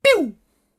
laser guns

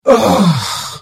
Hit; The Elder Scrolls III - Morrowind

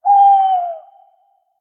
Tawny Owl; SyrinScape

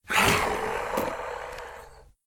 Ghoul Growl; SyrinScape; East Ward

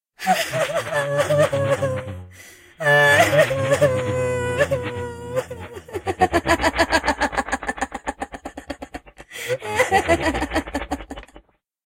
laughs; Nemira; SyrinScape; Iris hill manor

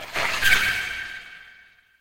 Dragon claw scratch; SyrinScape; Madness in the library

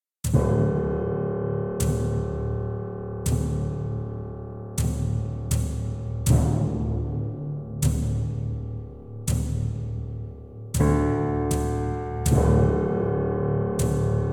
Flooded Cavern music; SyrinScape

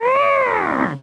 moan; The Elder Scrolls III - Morrowind; scamp

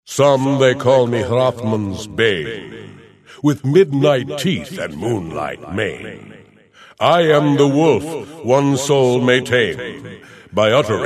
wolf door; The Elder Scrolls III - Morrowind; Morrowind GOTY GOG

